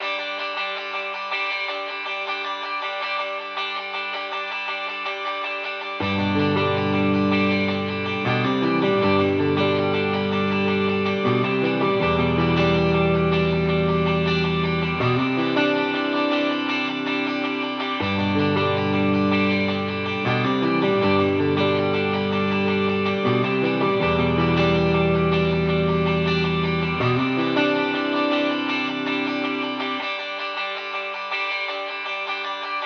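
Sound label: Kulor, electric guitar rhythm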